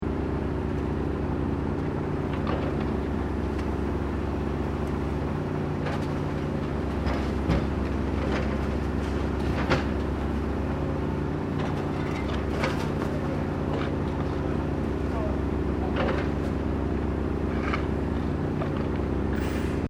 Construction Vehicle Earth Mover Operation Loop, Road Construction in Sannohe